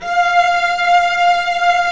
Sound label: Vcs2 f vb; String Master Series